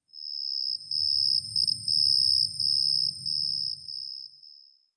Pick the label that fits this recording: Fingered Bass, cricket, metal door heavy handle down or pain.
cricket